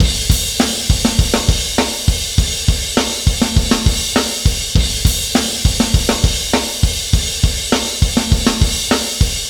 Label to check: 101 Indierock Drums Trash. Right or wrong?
Right